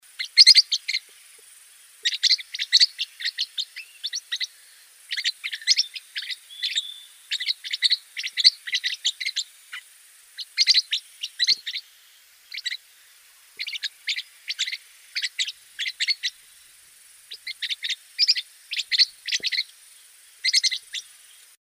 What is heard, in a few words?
Yellow faced parrotlet